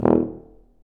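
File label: brass C tuba, staccato; Solo Brass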